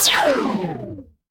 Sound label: retro game laser